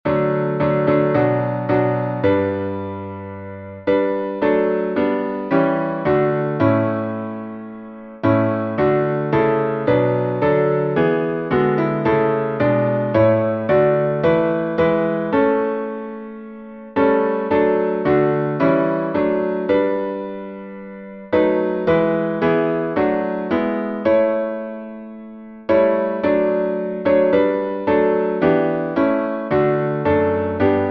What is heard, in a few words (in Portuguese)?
salmo instrumental